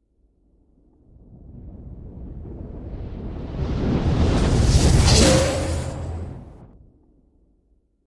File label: Zoom Stinger, whooshes swishes